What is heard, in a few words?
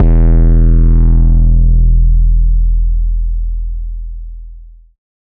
808 E; Cymatics